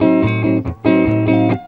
EGUIT BREAKS; Best Service; World Colours

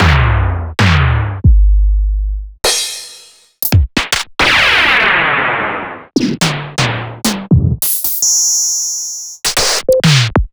Roland MV8800 drum SONIQ DRUMS

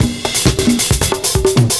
PERCS, 70 s Breakbeats

